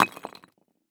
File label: Impact Concrete Cinder Block Hits Concrete; Impacts